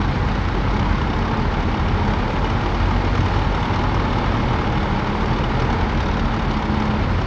battleship move

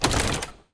ui switch option

